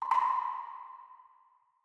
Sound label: nudge; rise of maya